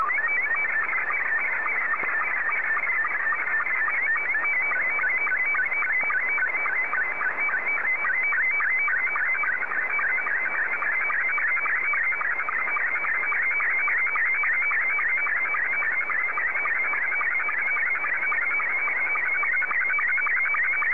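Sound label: SSTV, Scottie 2; JA3QJQ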